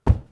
Ragdoll

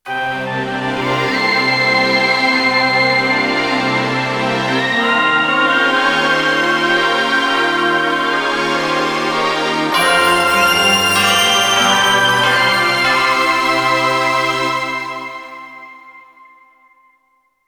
Stormy Mountain

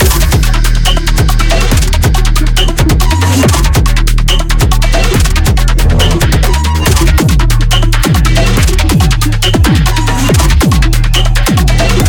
Biome 03 Teir4 Combatloop Start; Voidbreaker; ps5 windows xbox series xs

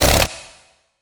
Turb Short; mahouteq

